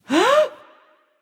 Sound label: breath; smite; voicepack; yemoja